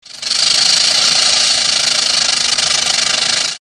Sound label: Rattlesnake